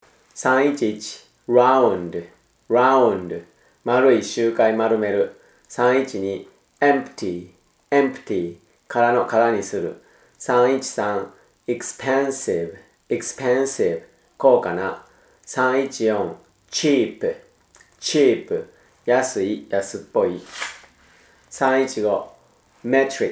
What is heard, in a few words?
tango